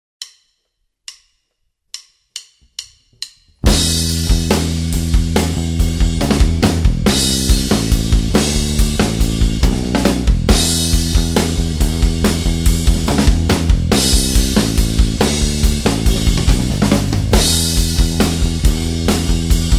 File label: style backing; guitar backing tracks